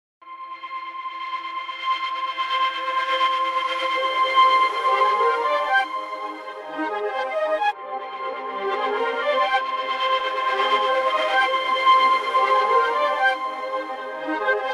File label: Evolved Wood Loop Straight Rev All High; VENTO; Designer